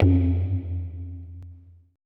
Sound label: E TABLA #F